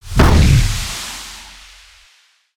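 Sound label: Shotgun Blast; Valiant Buccaneer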